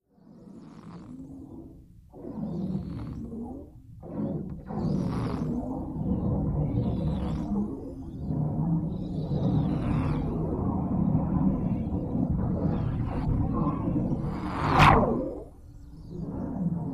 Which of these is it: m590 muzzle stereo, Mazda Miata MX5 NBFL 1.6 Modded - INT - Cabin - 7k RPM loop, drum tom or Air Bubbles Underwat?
Air Bubbles Underwat